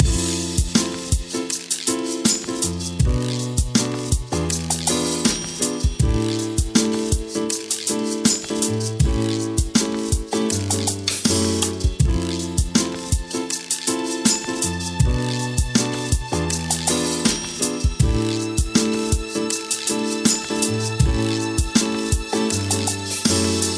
Groovy Chill Funk

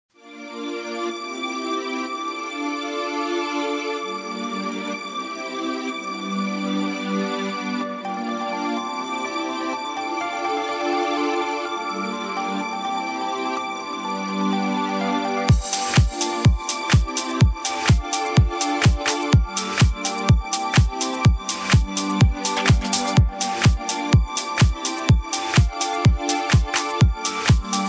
Funky Beats

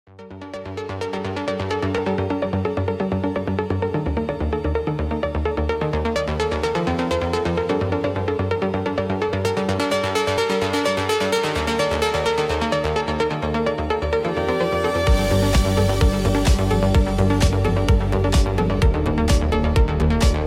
Electronic Impulse

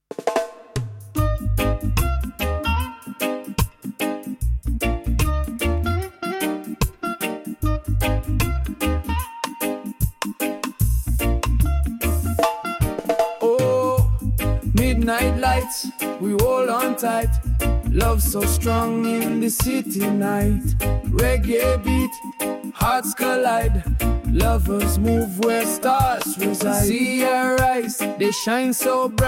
reggae

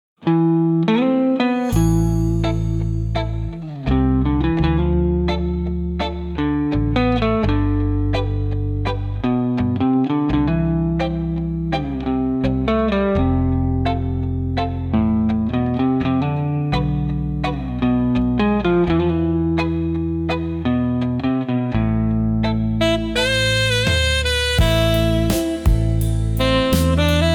sax serenity

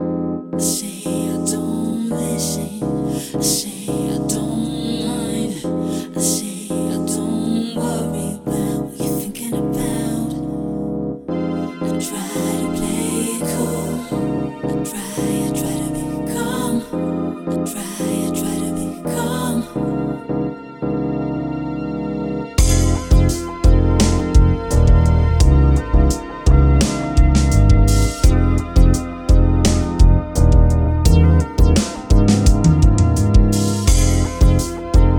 Slow Funk

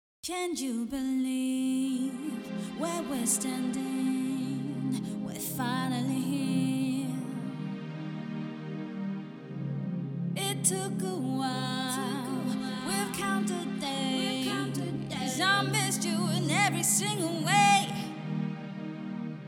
vocal chill, 432 hz

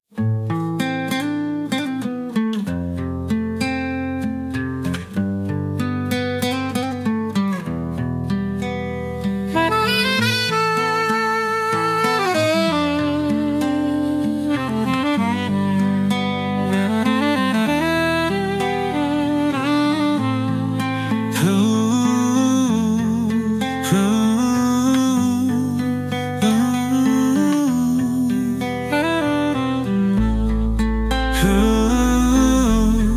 sax and soul

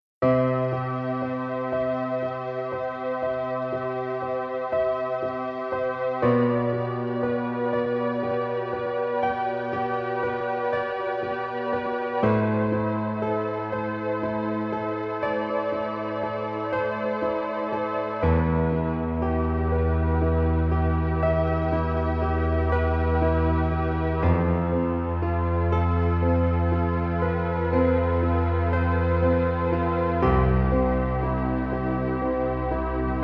spa music 432 Hz; energia zycia

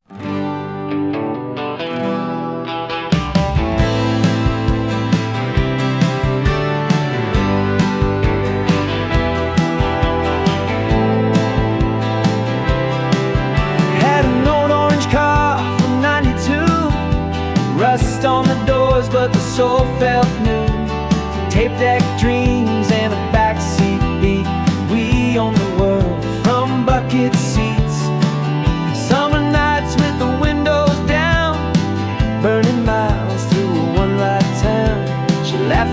jangle pop

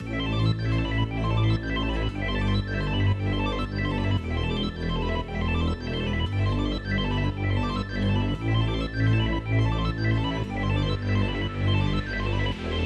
electro dance

